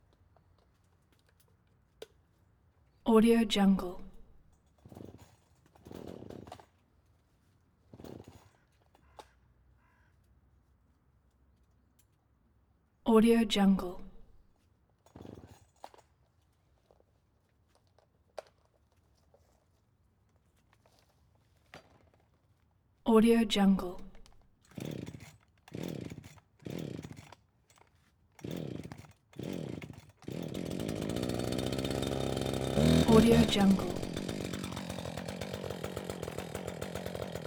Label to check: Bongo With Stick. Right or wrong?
Wrong